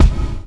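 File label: gated bass drum, Drums Acoustic; USB Soundscan vol.10; AKAI; 90s sample CD; GATEKIT 3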